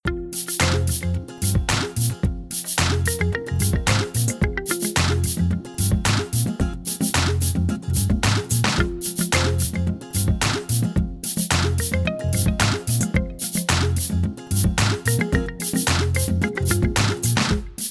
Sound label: music; cheesy-themepark; dontWhackThePack